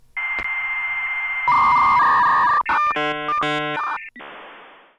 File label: modem